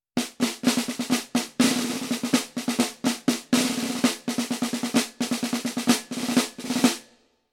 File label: Marching Snare Rolls